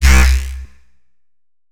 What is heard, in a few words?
Bass Shot, dubstep and trap; Ghosthack; Dubstep and Trap Pack 7